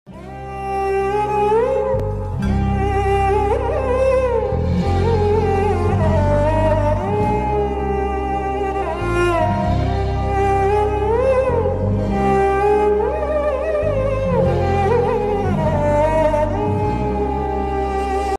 instrumental ringtone violin ringtone bgm ringtone